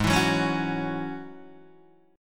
Ab 7th Suspended 4th Sharp 5th Ab down Guitar Open D